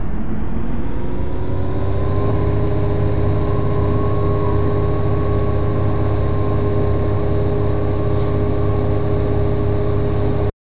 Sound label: hover move, ugv; ufoai